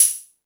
High Hat, E-MU Pro-Cussion

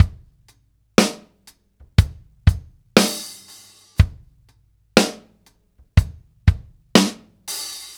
Ballad Loop, Drum, 70s Ballad; Laidback; Ballad Loops; Drum Tools

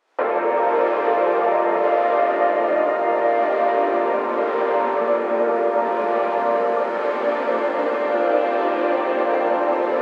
Atmos G minor; pad

